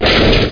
CRASH

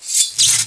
Magic; Majesty; OnlineGame

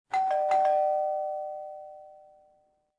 DORBELL, residential; zones

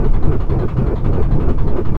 wheel

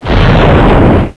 Short Explosion; Swoop 1.0.0; Ambrosia Software

